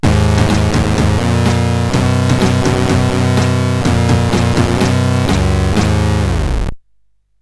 sid CSG8580R5

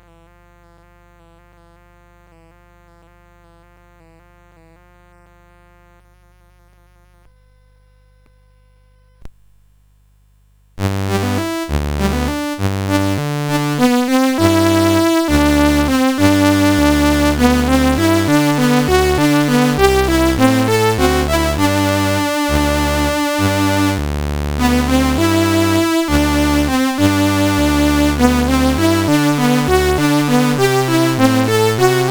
Dulcinea, CSG8580; CSG8580R5

